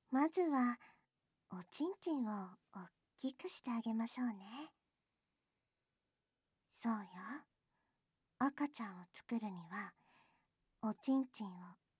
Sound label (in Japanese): お耳ふー 効果音なし, ASMR